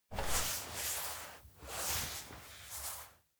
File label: bike stroke gentle; koyomi; anemoi